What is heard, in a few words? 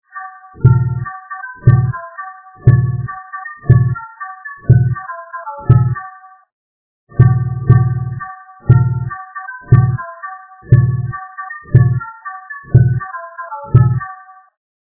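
with drum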